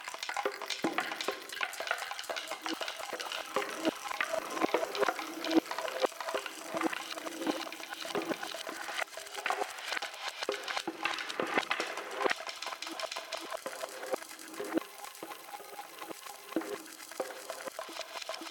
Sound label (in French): cuisine les cuilleres de bois; La cuillère en bois